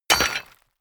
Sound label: drop metal dirt; hits explosionen; metall